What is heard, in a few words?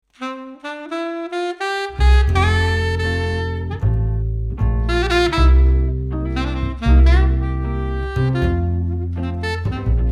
Dixie Swing medley